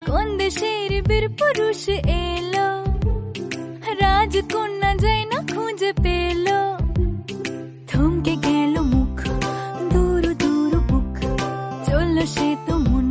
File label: bengali song